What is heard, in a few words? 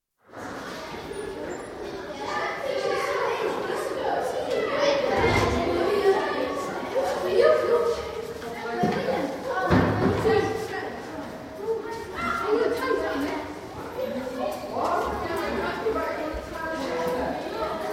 Mixed Comprehensive School, general chatter in school corridor (quite busy) in large comprehensive, pupils of mixed ages & sexes, with doors banged; BBC